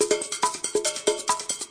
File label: latinocrazy loop, drum loops and breaks